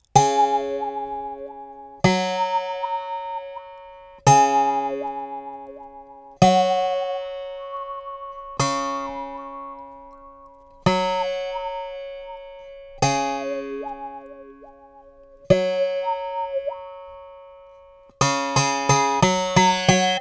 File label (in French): 2 cordes classic, guimbardes; comicbow